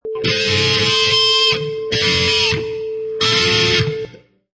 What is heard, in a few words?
Distorted Guitar Riff in Cm Loop